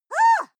female shout shocked angry; schreie; menschen

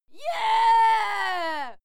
female cheer yeah throaty; schreie; menschen

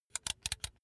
razor extend comb long; maschinen; rasierer

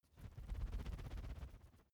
feather flapping; kreaturen

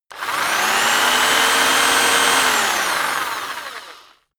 rock drill rev low long

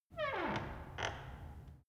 wood door old creak high soft

